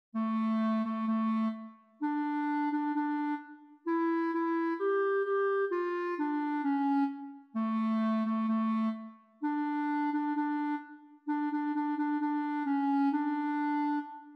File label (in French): GLORIA CLARINETTE; St Jean